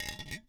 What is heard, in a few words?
Grenade Roll; zps; Weapons; Explosives